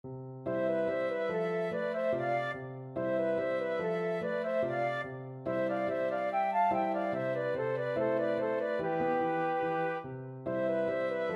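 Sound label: lakme, flutes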